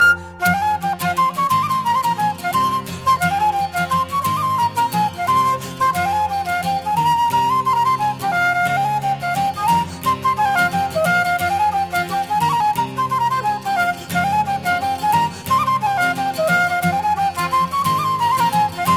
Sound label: Gavotte; Bro Dreger